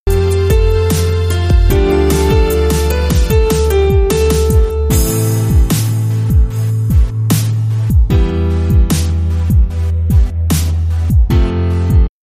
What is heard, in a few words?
Beat